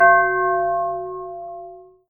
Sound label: lucky effect